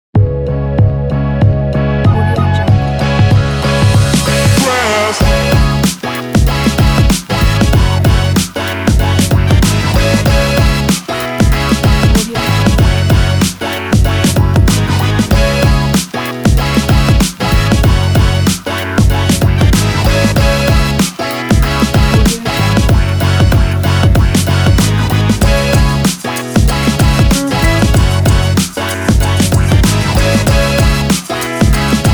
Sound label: urban hip hop